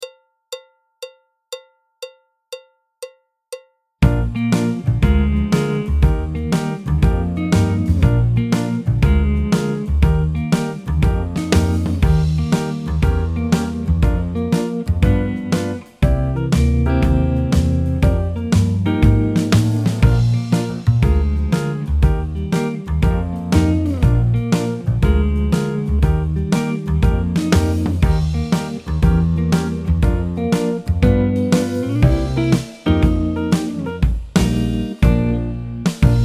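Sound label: Swing backing track, Jazz; clarinet, flute; Jazz Syllabus Level 3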